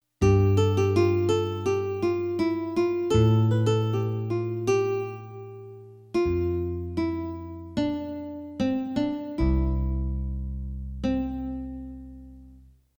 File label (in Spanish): Guitarra